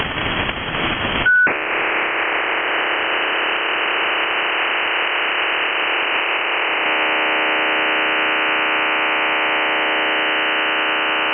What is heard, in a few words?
ofdm burst; iarums